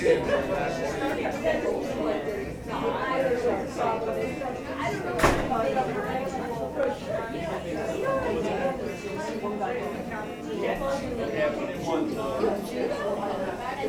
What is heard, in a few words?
Bar Ambience